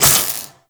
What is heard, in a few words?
Plasma Grenade Hit